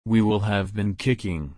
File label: kick